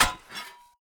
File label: 2handed sword strike soft; Rust